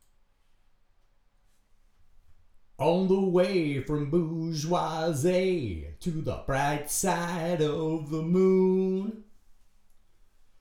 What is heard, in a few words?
vocals